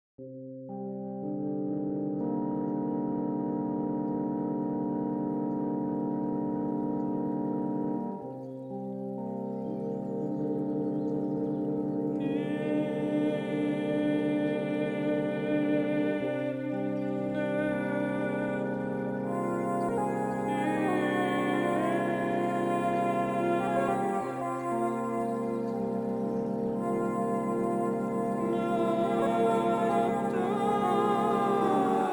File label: Cm in the forest; tonnetz